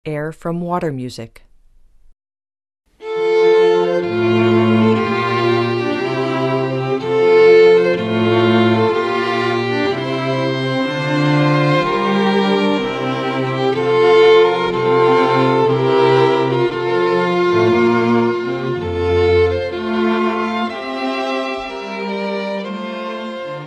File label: air water music, string quartet